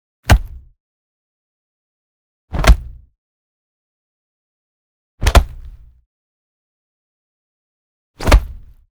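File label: Punch Processed Punch Block Kick Large Small; The Sound Keeper; Combo Pack Sound Effects Pack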